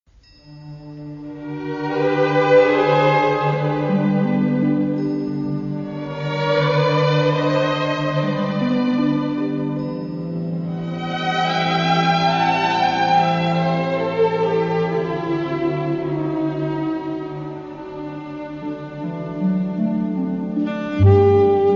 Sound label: Waltz